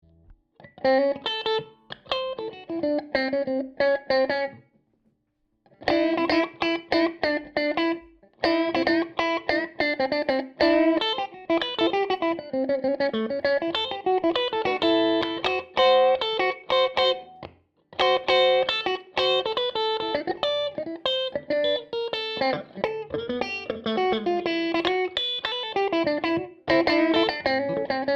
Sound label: Fender Tele Thin; GEH150